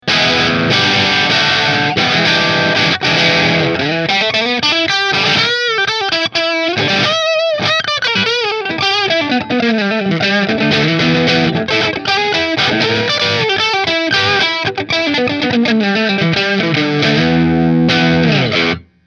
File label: Nola Model 8 Sunburst Bridge Marshall; New Orleans Guitars Model-8 Sunburst